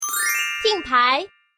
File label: TING, girl; ermj